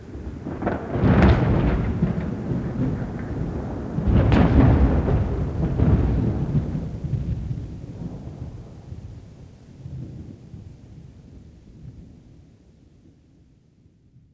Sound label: thunder; hogwarts